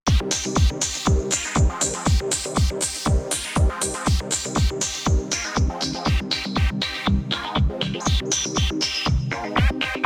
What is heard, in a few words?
Fiesta Beat